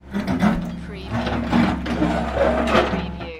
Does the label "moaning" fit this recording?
No